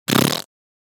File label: Fart Foley Surprise Loose Rip; Hollywood Edge; sound designer's toolkit v2